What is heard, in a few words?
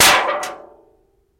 Aluminum Siding Impact, Warble